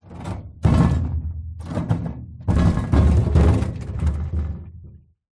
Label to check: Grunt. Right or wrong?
Wrong